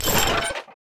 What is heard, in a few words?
banner up